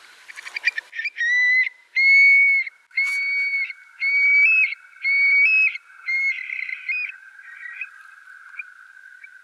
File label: call, Stone curlew, mixed call (strangled + whit call)and by two barely audible whit calls; I recorded this call sequence on breeding ground; Burhinus oedicnemus